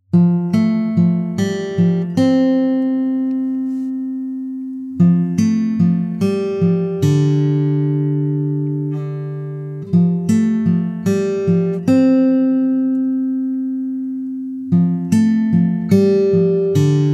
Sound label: Island Baby (Korean)